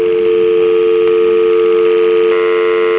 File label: dial